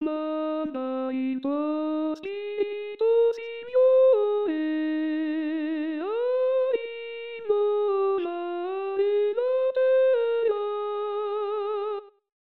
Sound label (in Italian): Coro; Manda il Tuo Spirito; Pasqua